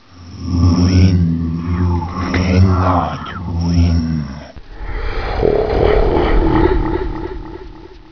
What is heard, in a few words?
win